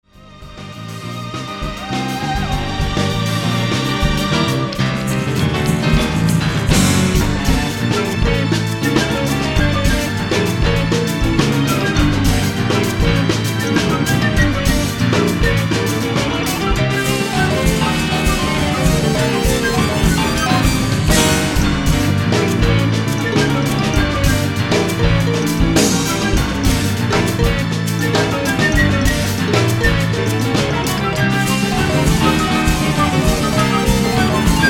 Gaita de Tambora

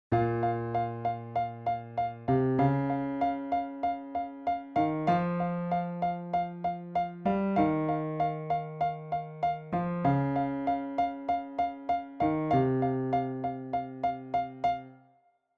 Piano Ejemplos Tocados

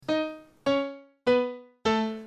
Cadencia en frigio; Mezcla Ingles

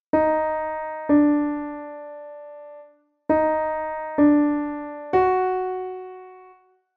Melodia Pasaje Inicial Piano

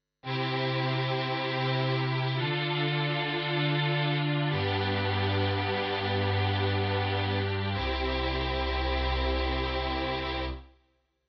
sin voz

